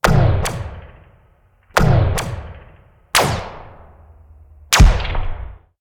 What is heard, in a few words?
Laser Harpoon Shots